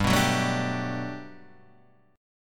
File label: G Major 9th, G, down, Guitar, Drop D